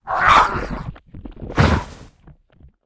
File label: ghoul dead, monster